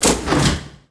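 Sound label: door hangar Elevator start; Star Wars Obi-Wan; XBOX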